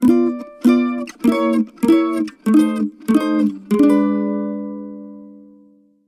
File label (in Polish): Mandolina